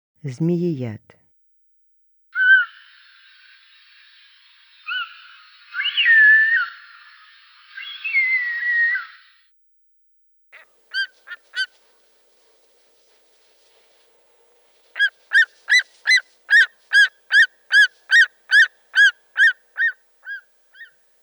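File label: zmeeyad golos, short-toed snake eagle voice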